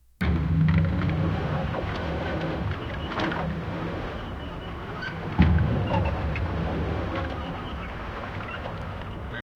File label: ship